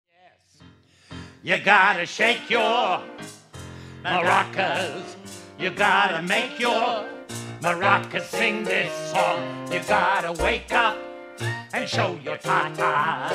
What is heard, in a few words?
Shake Your Maracas